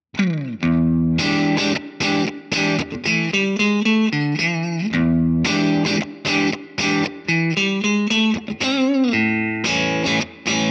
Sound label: breakup; jensentone